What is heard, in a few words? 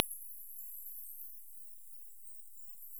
Myotis macropus